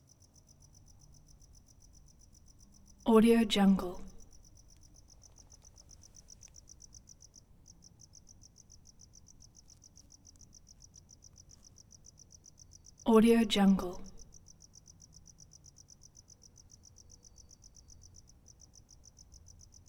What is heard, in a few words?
single cricket late night; prosoundfx